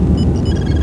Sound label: chirp; Star Trek